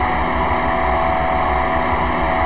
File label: Motor, Train; OpenBVE